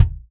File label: Real Drum Kit, ROCK KIT